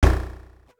attracted, message tones

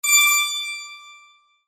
tiny rings; standard ringtones

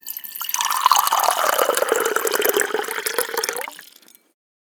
pouring water into glass; kitchen; sounds from the kitchen

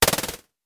strongly attached, message tones

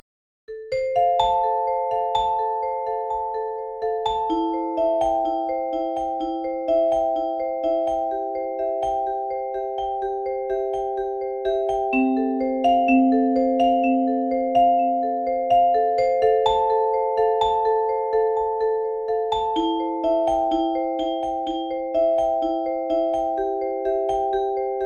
lovingly; wake-up-tones

